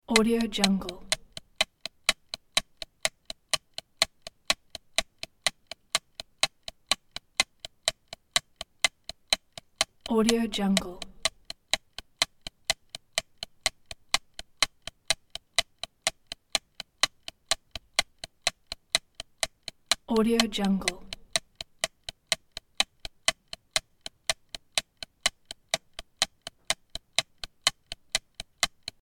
timer clock ticking loop; domestic sounds

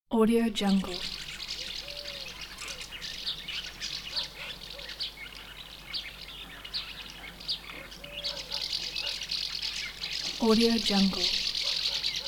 swallows gathering at late summer countryside; دسته پرستوها در حومه; nature sounds

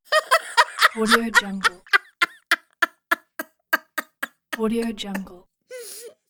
mature female laughing; human sounds

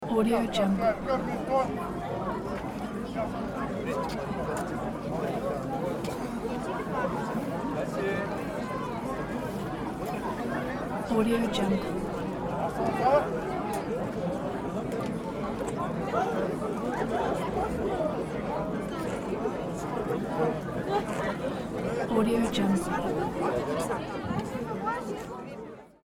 crowds walla chatters children voices; urban sounds

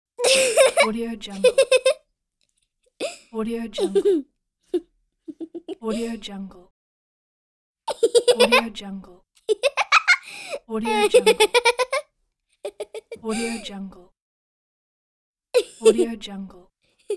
young girl laughter; human sounds

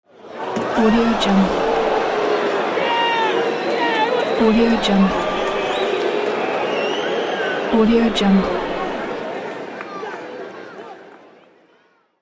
crowd cheering wrestling game; تشویق تماشاچی های مسا; human sounds

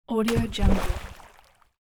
water splash; افتادن چیزی در آب; game sounds

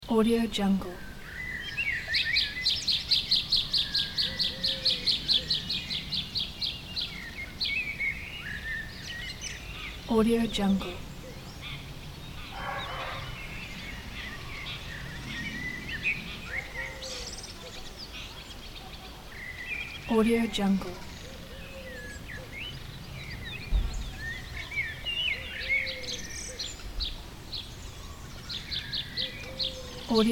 small village ambience at summer; nature sounds

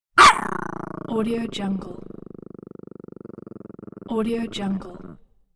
chihuahua makes angry snap and growl; خرناس و غرغر عصبانی سگ

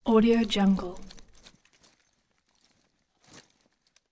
gear movement soldier; cartoon; دویدن سرباز با تجهیزات

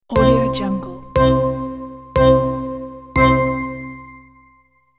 playtime island countdown

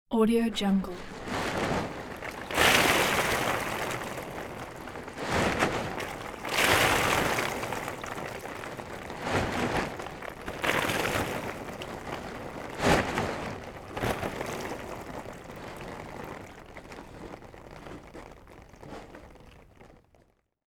removing tarp cover after rain; industrial sounds; برداشتن کاور برزنتی

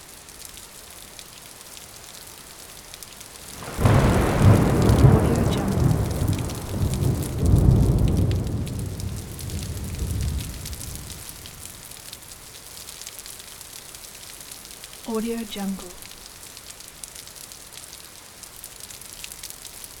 thunder and rain

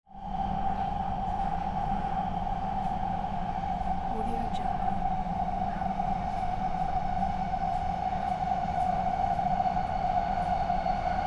metro train ride inside ambience

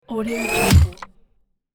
transition frantic fast hit wooden dampened; transitions and movement; بیرون آوردن چوب

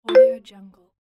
select button; interface sounds

